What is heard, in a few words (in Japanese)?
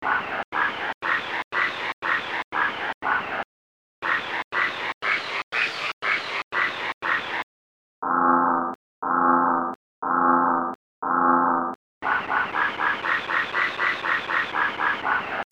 kaeru Mix; frog song; Ringtone